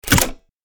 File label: Rocket launcher loading; artillery